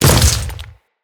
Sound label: Step Flesh, Footsteps; Enemies; Grineer